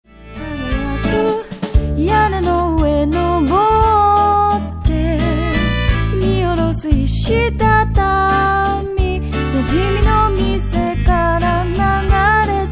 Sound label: musette